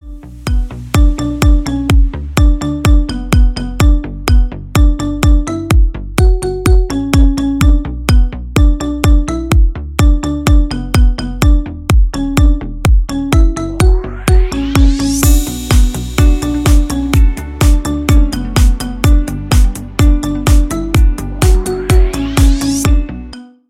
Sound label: marimba remix